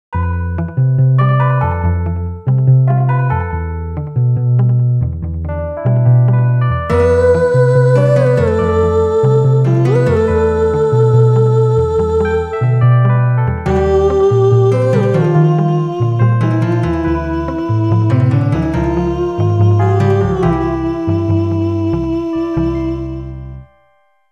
New Wave Bossa Nova

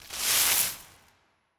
Broom

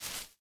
Footstep Foliage Bush Small; viewfinder

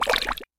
Obstacle Juicebottle; In Obstacle Juicebottle

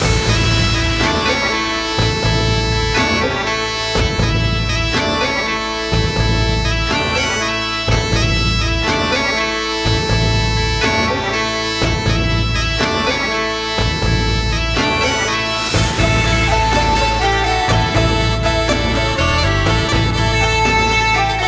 bgm fishing; MiniGames; bgm MG fishing

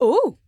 Startle

